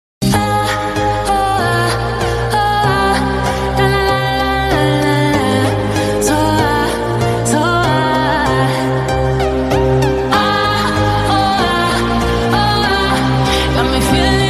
Aah Aao Aah Edm Music

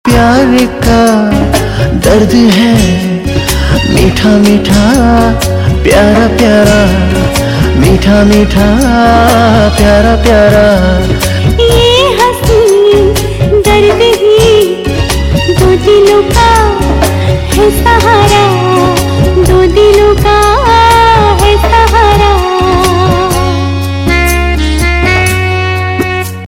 Male

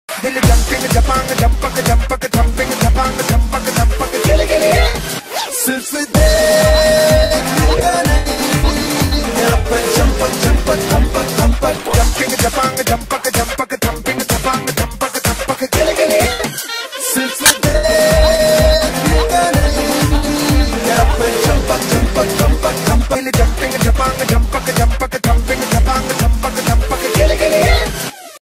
Jumping Ringtone; Ringtones; Zapak

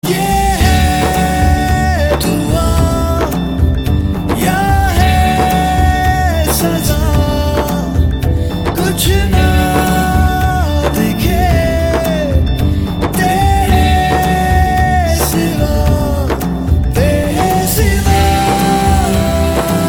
Fast Tone; Bollywood; Delhi Belly

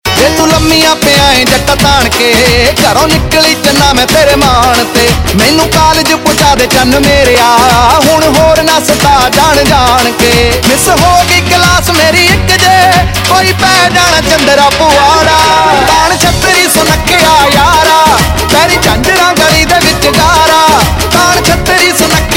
Ringtone, Punjabi

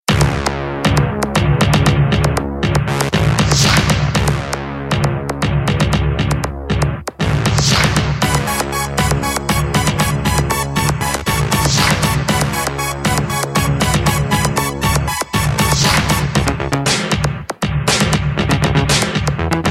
Beatronic 3D

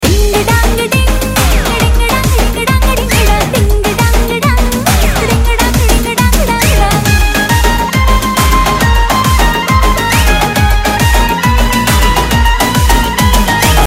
Instrumental, Ringtone; Bollywood; Baaghi 2

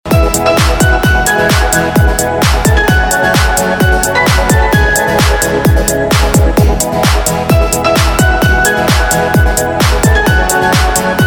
Instrumental Ringtone; Bollywood Hits (2014)

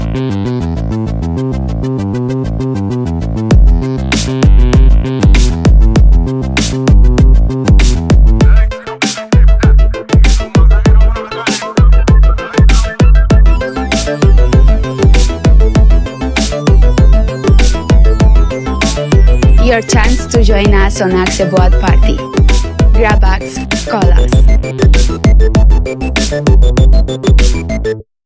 Boat Party Music

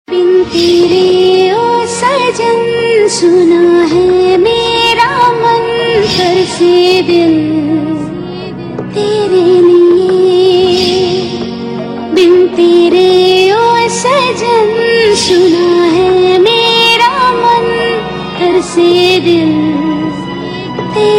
Female Version; TV Serial Tone; Sony; Baat Hamari Pakki Hai

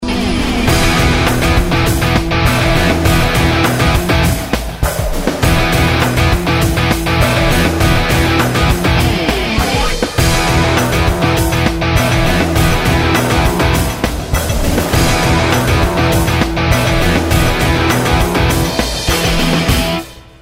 Sneak Peek promo music; Bigg Boss

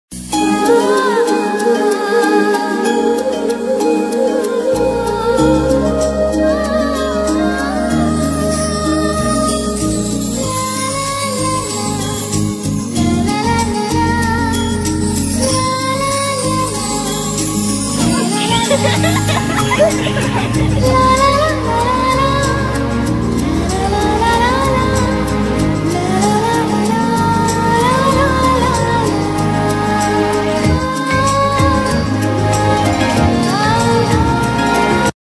Soft Instrumental Love Tune; Raman Ishita; Tv Serials; Star Plus

